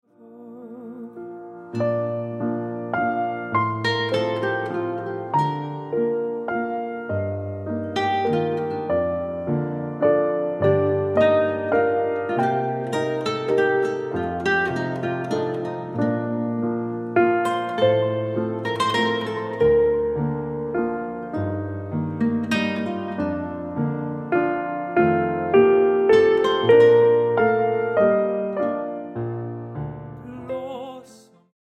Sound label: Nylon sample